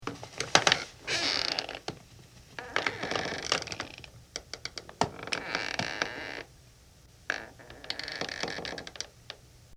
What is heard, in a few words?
floors creaking